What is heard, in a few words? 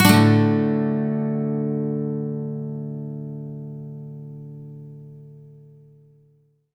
MAJ SWEEP D, Acoustic Guitar; USB Soundscan vol.04; AKAI